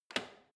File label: washing machine snap button press out